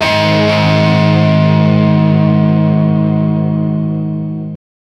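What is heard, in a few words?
electro guitar death metal, rock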